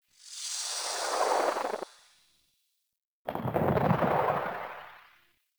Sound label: Death Transition; Warframe; Caliber Chicks Minigame; Transitions